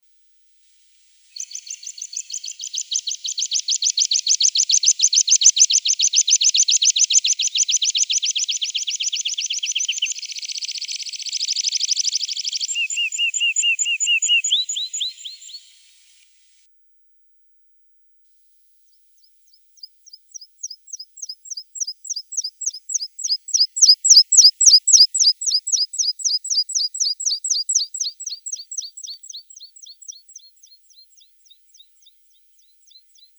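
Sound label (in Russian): Konek lugovoj, meadow pipit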